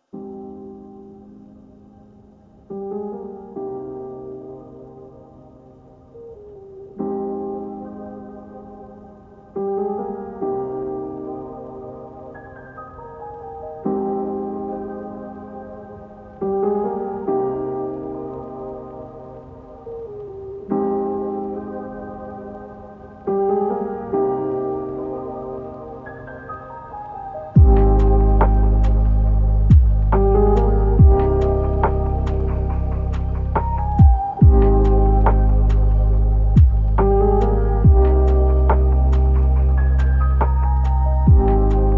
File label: lofi hip hop beatz